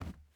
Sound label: plastic jump